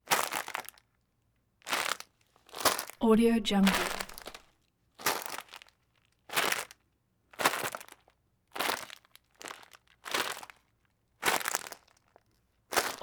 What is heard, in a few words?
plastic bag grab crunchy cellophane type